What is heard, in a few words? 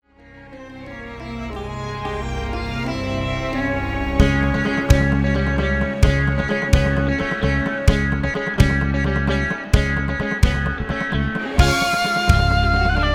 east indian dance